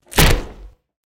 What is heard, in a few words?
body impact wood